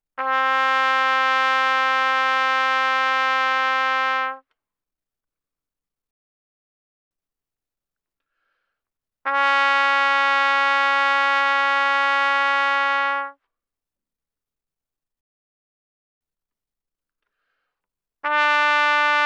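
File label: Trumpet no vibrato ff C4 B4; Brass; Bbtrumpet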